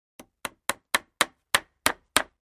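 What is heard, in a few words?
2x4 Hammer Nail On Cement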